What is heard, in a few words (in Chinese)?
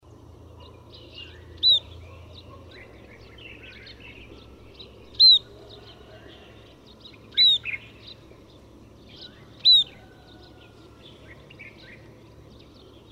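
斑文鳥, Yushan National Park; 梅花雀科/留鳥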